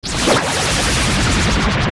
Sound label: Hit; Barinade; zelda; OOT